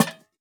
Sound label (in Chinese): 灯笼放置声